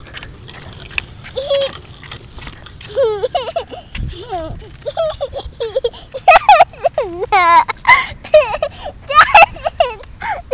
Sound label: Laughing